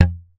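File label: thumb, bass; Xsample VOL-1; SLAP+THUMB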